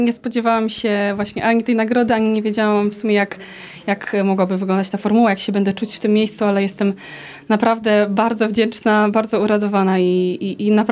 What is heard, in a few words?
Jazz